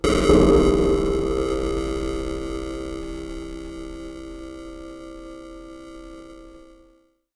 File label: Bit Crush & Moog Delay, Wire & Tin, contact mic; musicradar; contact mic madness